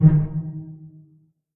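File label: string pizzicato D#2 pianissimo right; Sound On Sound - Peter Siedleczek's Orchestra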